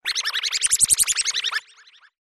scratch that; ringtone

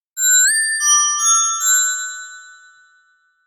jingle all the way, christmas ringtones

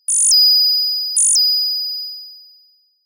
ringing sound; standard ringtones

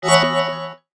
results, message tones

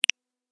slick notification

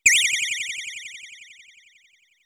springy; message tones

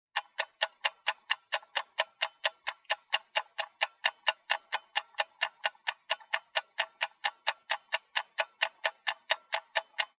kitchen timer ticking; sounds from the kitchen

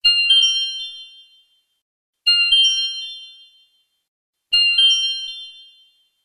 message tones; oringz pack nine

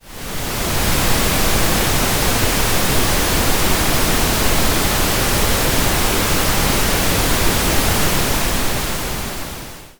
pink noise

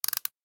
wind up, message tones

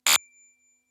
glitch in the matrix; message tones

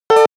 milestone, notification sounds

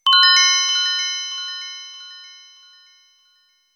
arp

